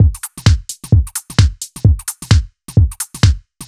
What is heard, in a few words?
Beat 130bpm, uk garage